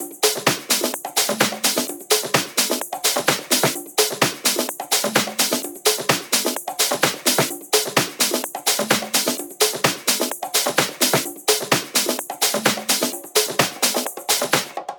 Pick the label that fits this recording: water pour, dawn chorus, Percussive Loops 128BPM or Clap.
Percussive Loops 128BPM